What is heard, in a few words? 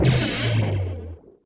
Lost Ball; Ricochet